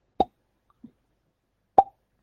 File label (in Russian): na budilnik